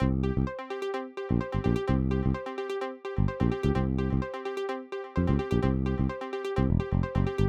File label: MIX TECHNIQUE COMPRESSOR SMACK ATTACK ON